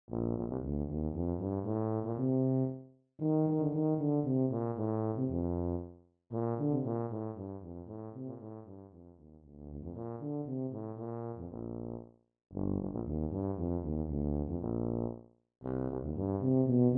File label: Tuba